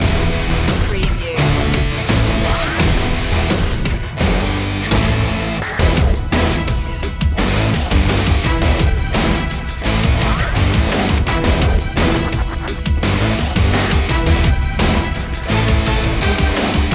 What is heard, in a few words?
hip hop big cinema loop